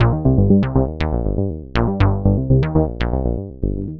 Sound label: Bassline, Prototechno